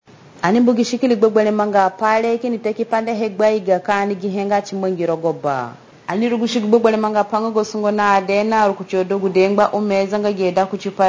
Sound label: Radio Jingle; ROA; Azande; reachafrica organization rao south sudan